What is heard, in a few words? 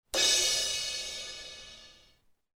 Medium Thin Crash; SONOR